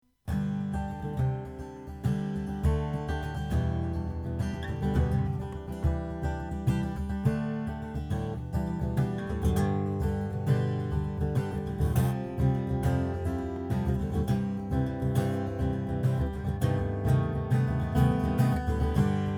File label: 1999 leach saratoga burled walnut